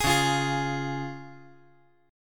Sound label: C sharp Major